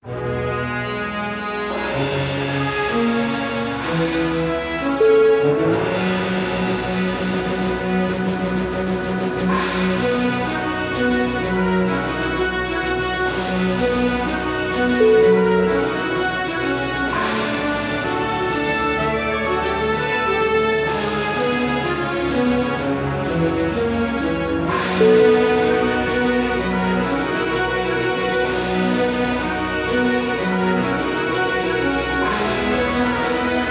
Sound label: march of triumph